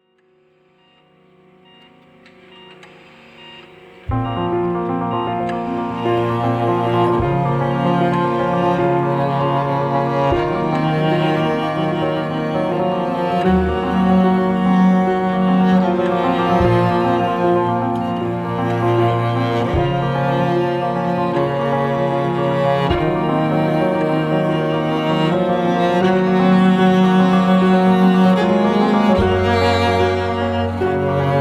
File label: Piano